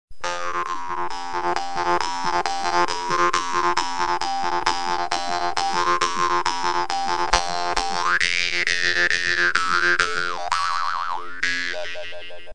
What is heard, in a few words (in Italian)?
Trunfa